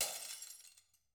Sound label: glass smashing; angband